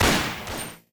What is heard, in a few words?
title screen start; mgs